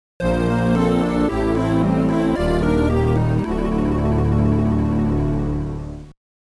Item Collect Jingle; Metroid Zero Mission

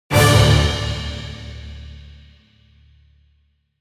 Training Completed Jingle; Metroid Prime Federation Force

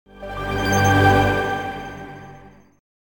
Artifact Obtainment Jingle; Metroid Prime Pinball

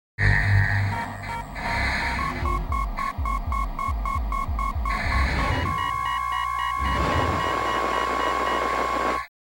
Game Over; Metroid Prime 2

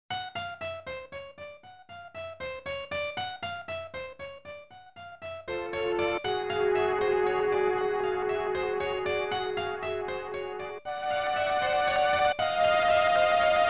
Mini Boss Confrontation BGM; Super Metroid; Spore Spawn; Botwoon

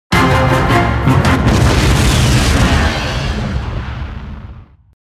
Mission Failed Drill Destroyed; Metroid Prime Federation Force

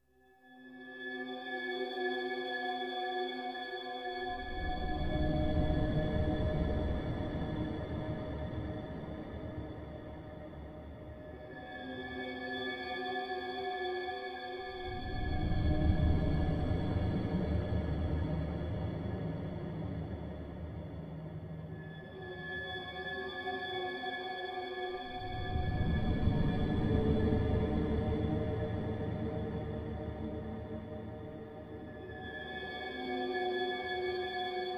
Environment Sound Tension; Metroid Other M

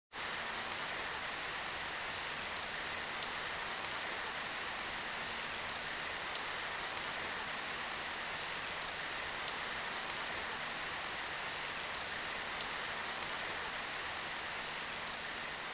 Environment Sound Rainstorm; Metroid Other M

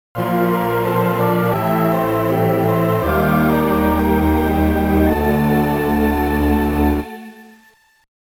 Jingle; Legendary Power Suit Obtainment Jingle; Metroid Zero Mission

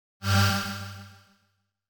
Jingle; Metroid Prime Pinball

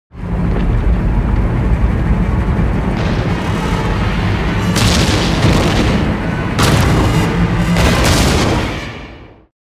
Boulder Trap Activated; Mission 2; Metroid Prime Federation Force

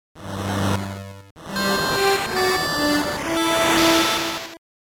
Samus Appearance Jingle; Metroid 2 Return of Samus

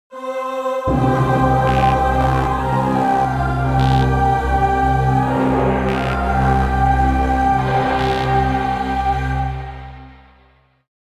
Key Return Jingle; Metroid Prime 2

